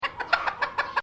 Bird Chickens Japanese Clucking, Chickens from Northern Japan